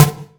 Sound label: Snare; ModelCycles